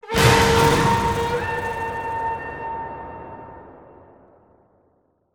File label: Music Stingers - Battle Stinger